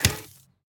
robot footstep single; sonniss gdc

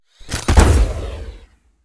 heavy no reverb shot; sonniss gdc

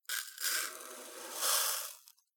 sugar into cardboard cup; fvtt sonniss gdc audio

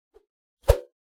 melee attack swoosh light; psfx; weapon swooshes

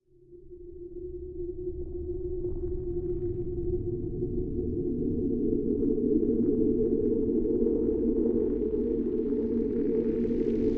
drone shimmer; sonniss gdc

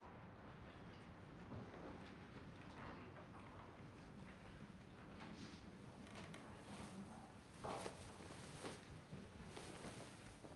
human presence large room busy; sonniss gdc

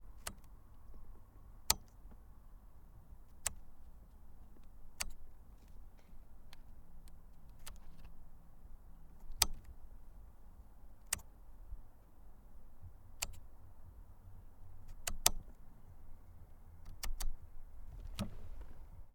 textron alterra 500 var light switch; mkh8060; Sonniss GDC

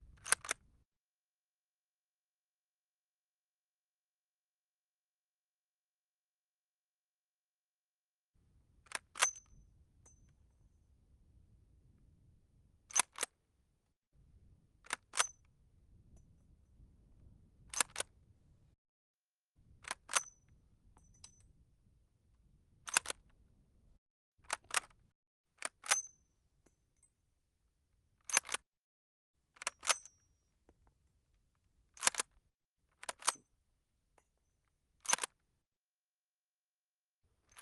handling various above right; 1m; mkh8060; Sonniss GDC

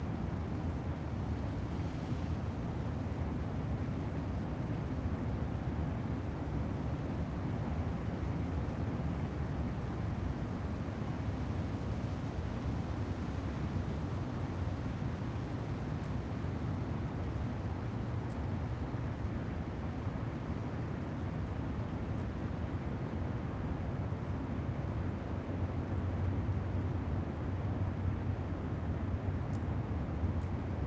outdoor city park at night with distant traffic

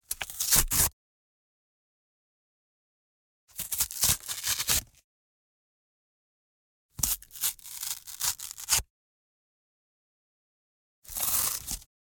rips and tears cardboard rip; fvtt sonniss gdc audio

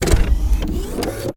ui mechanical turning on raw; sonniss gdc

